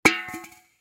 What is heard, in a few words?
Can Drop Carpet, Can Drop Carpet Aluminum Empty; Foley; Drops; Blastwave